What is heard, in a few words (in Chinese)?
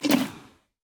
进食声